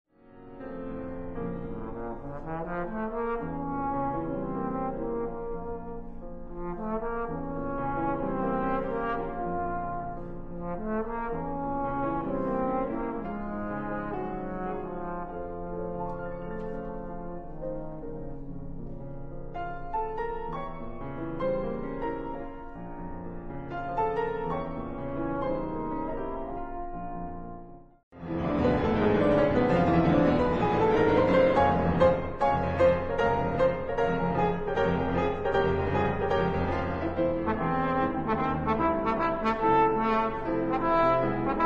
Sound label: Trombone Rhapsody; Schneider